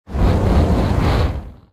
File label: Fireball Roll